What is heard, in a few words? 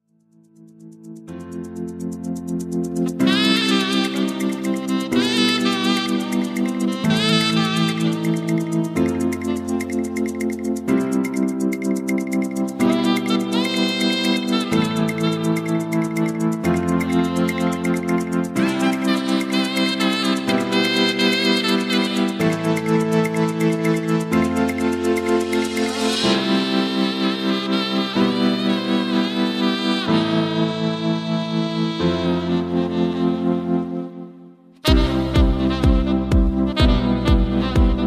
sax